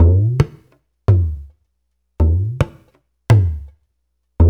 Dara Dance, Darbuka; Spectrasonics - Supreme Beats - World Dance

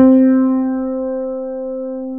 CP70

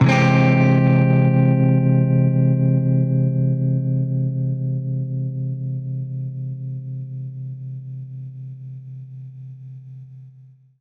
Baritone Chord Fmin9[maj7], Guitar Chords; undercover samples

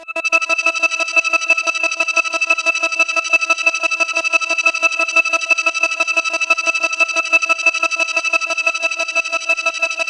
C5, SynthLine; 90 s Sample CDs; NiceBeats; SynthLine VOL-7

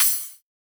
808 & 909 Kit CRASH; Roland Juno-D

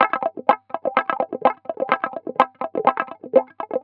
Guitar Wah Loop, 125bpm; Zero-G; Nu Disco

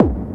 TEKK BASE, DRUMS PERC; Q Up Arts; Dream Experience

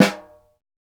snare, Roland; LA Composer

